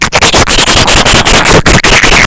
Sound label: pulse machine, weapons